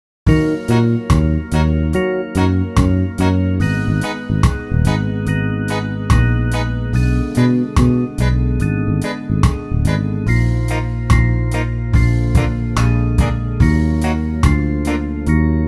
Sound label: Eb C Minor Scale, brass; simplybrass